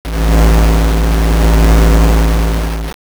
space engine

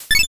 line clear

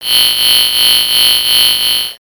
cicada

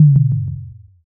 tom

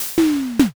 hatch

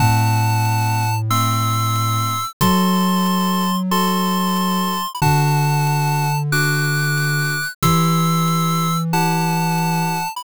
bgm mellow

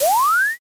firework launch

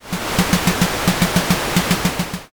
train run

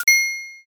register

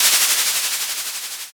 scroll open

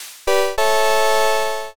next preview

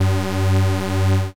wrong buzzer

